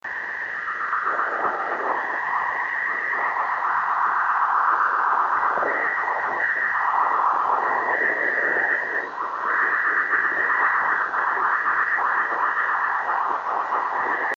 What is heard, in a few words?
unknown Data 26600KHz; unknown type transmission on 26.600 MHz 03-NOV-2014